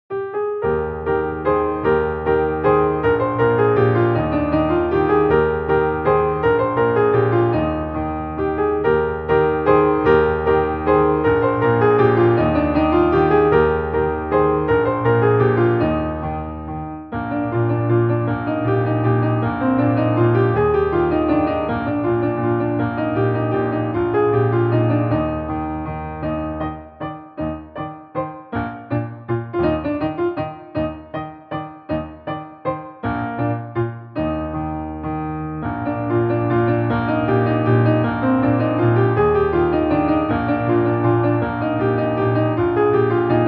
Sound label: German Waltz